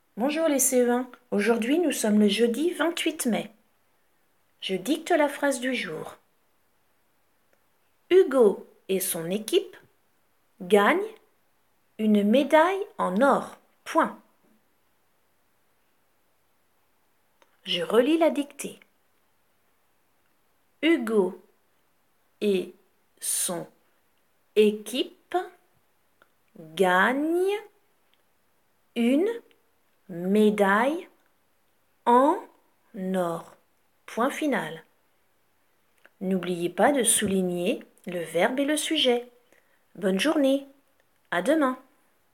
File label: Vocal